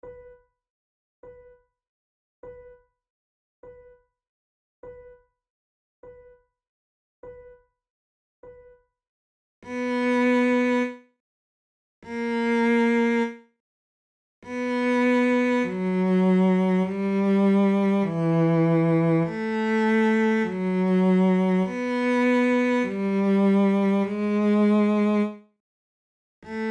adagio, flute

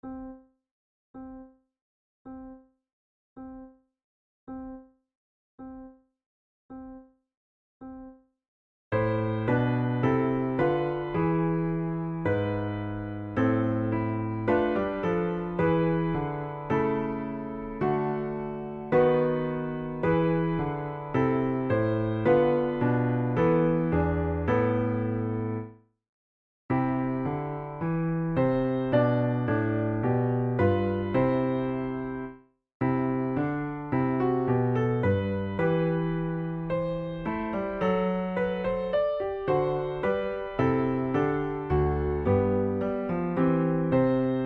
sonata in c major adagio, flute tunes; loeillet de gant op3 no1 iii

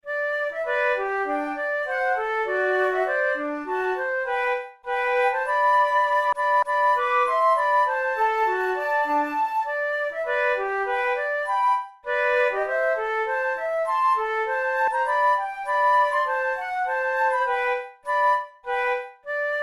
duet in g major, flute; kohler op93 no1 duet no2

